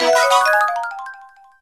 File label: jingle light